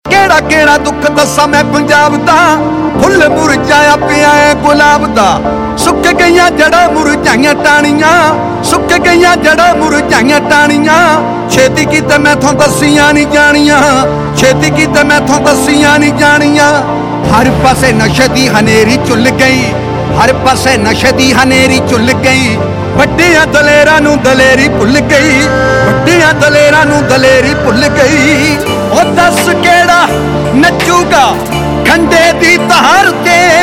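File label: Ringtone, Punjabi Pop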